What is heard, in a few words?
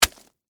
Impact Celery, Texture, Impact, Celery, Vegetable; Texture, Impact, Celery, Vegetable 3; Miscellaneous Impact